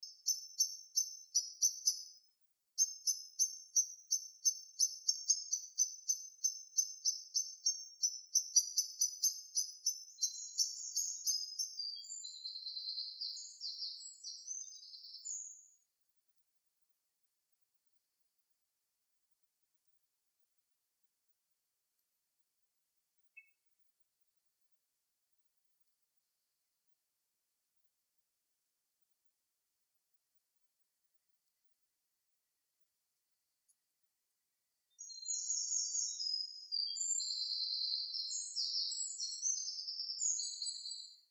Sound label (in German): Lichtung Soundaufnahme Botanischer Garten Marburg